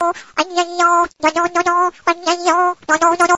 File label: Ai Yai Yo Funny Ringtone